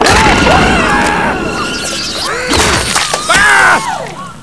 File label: scream, scientist